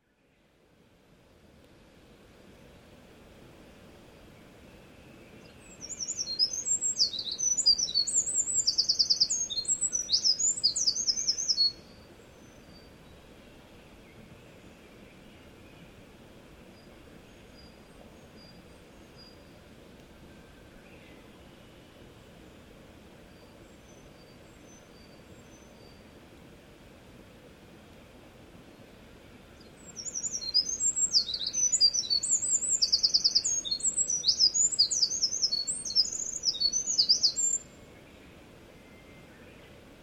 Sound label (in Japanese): misosazai, bird